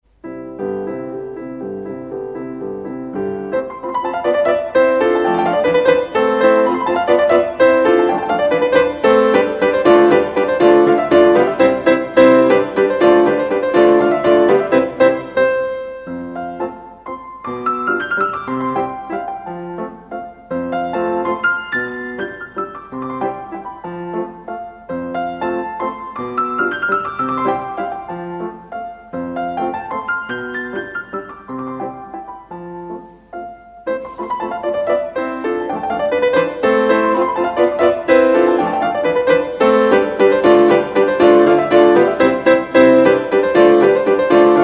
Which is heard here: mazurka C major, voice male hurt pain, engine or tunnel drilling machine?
mazurka C major